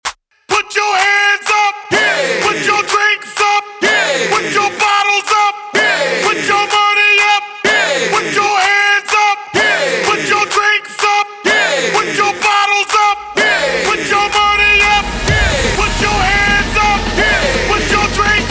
Clappela Bootleg 126bpm DIRTY

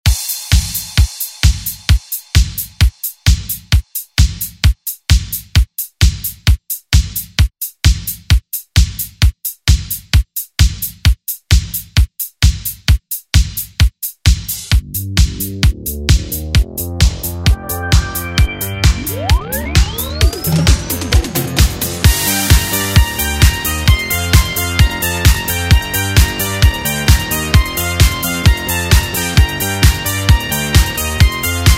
80s ReDrum; DJ Mhark

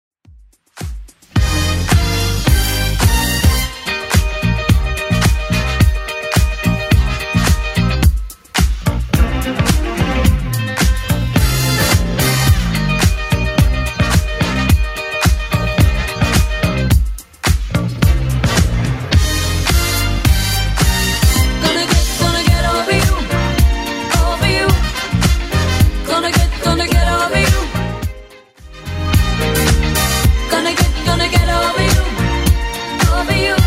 80s Club Edit 108bpm

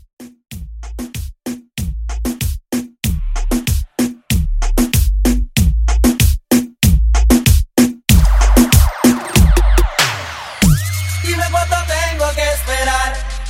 Reggaeton Club; Reggaeton Club Edit

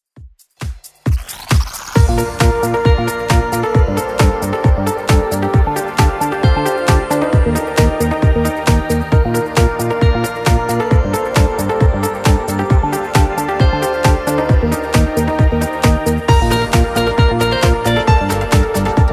90s 128bpm; Redrum V2

